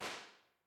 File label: Drums, Impulse responses; GML; Henry Olonga; The Beautiful Room